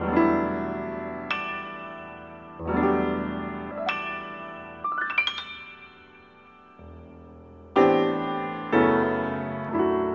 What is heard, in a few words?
093 cut up gorgeous piano; melodic loops; keys organ piano loops; muramasa